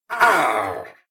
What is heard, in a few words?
Combat; Eshimal; SyrinScape; Snarl and Oraculum